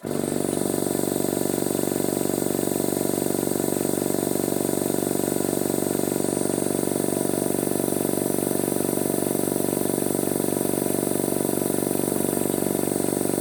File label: air compressor